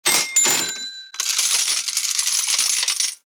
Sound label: Cash register bell cha ching purchase